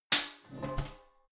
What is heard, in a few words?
garbage can close lid spring click